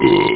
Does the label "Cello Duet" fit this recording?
No